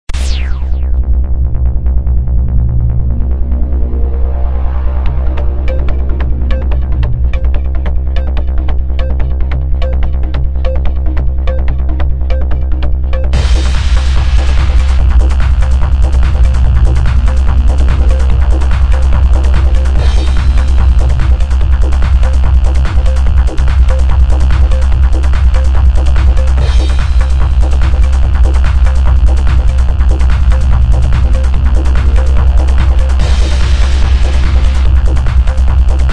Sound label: tubular bells